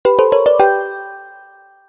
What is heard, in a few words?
Technique Clear; Picross 3D 2